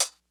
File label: closed hh; eddyflux